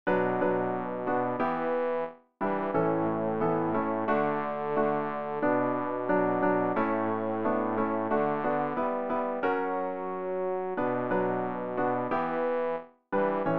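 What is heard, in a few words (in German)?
bass, Übehilfen für das Erlernen von Liedern; ref gesangbuch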